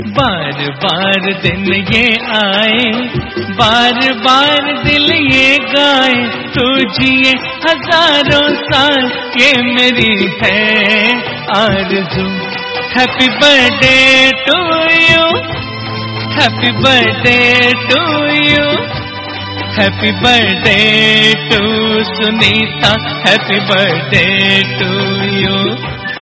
Happy Birthday Hindi Ringtone